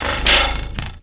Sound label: snap, percussive